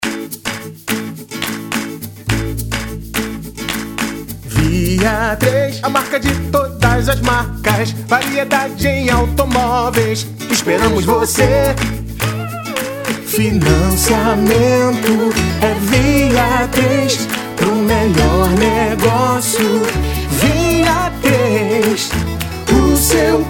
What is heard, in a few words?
jingle